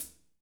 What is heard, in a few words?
foot; ID KITS; Sonic Reality; Interactive Drum Kits; 90s sample cds